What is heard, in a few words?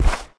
footstep, manticore; smite